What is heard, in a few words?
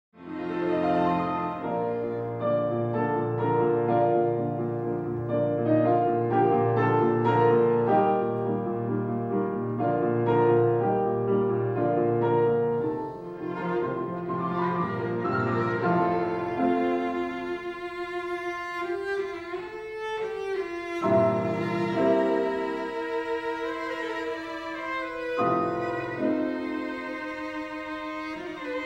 Piano Trio in B flat Major; Beethoven; Op. 97; Archduke; First Movement